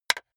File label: wood board hit hard; objekte; holz